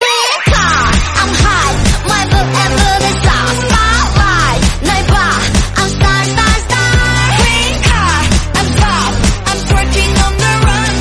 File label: korean song